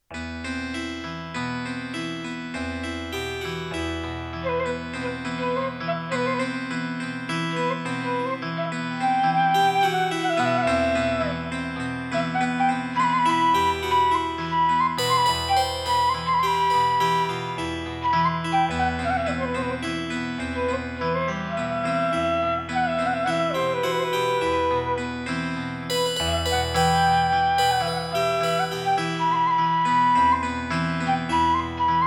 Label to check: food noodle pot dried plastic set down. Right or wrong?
Wrong